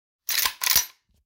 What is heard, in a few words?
breechblock